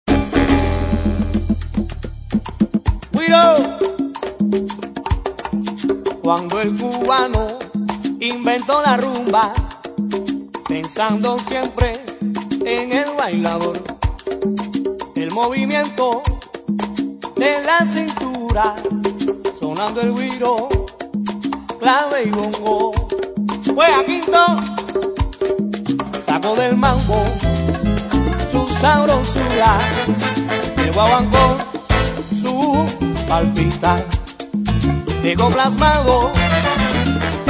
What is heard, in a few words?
con Guiro